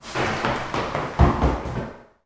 foley footsteps running towards microphone hard floor reverberant room